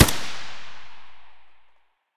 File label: ak74u muzzle mono distant, weapons; STALKER; IWP